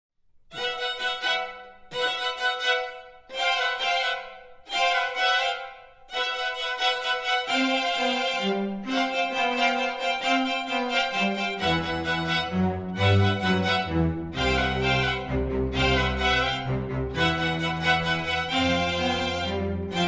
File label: strings